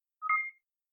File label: beep